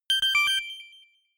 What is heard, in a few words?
notification pager; kommunikation; signale